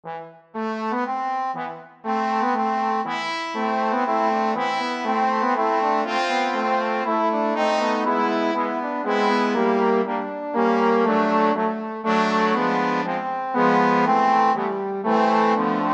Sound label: trombone quartet